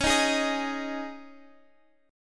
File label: C sharp Suspended 2nd